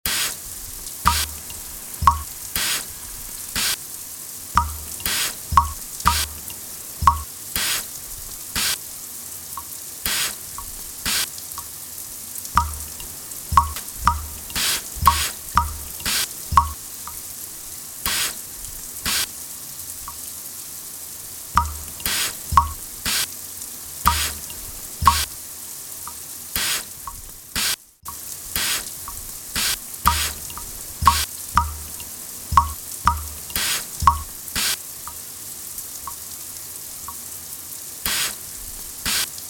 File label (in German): Wasserklang